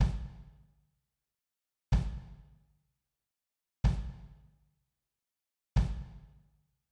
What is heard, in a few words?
Kick Plate